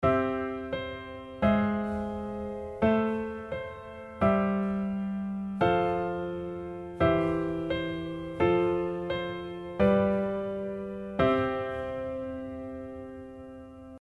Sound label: chordtone one note, longtone pattern